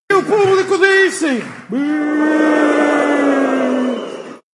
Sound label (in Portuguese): o publico disse buuhhhh